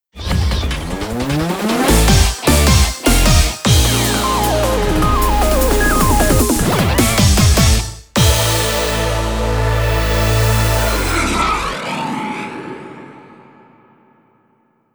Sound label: Jingle Version; Soreyuke Burunyanman Ecstasy